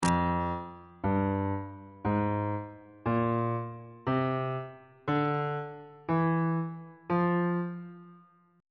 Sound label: f melodic minor key signature